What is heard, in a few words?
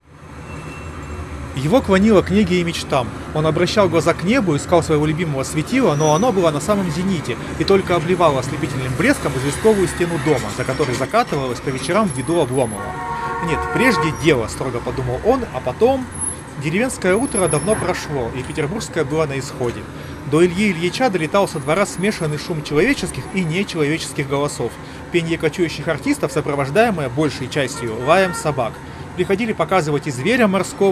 Recorder noise; ugreen studio pro hp206